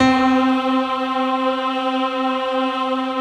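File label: C 3, PIANO CHOIR; Best Service; Advanced Media Trax Modern Composer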